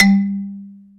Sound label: XYLO G 2; XYLOPHONES; AKAI S-Series CD-ROM Sound Library VOL-7